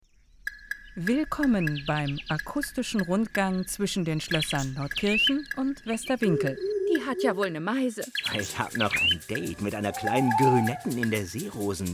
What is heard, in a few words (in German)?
Jingle akustischer rundgang; schloesserachse